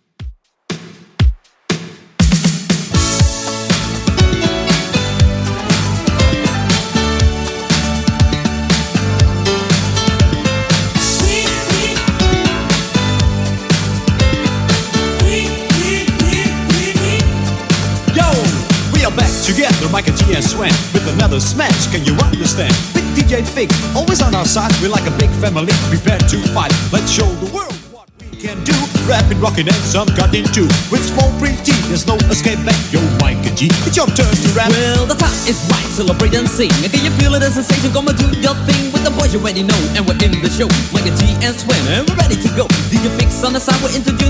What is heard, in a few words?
Rap 80s Redrum 120 bpm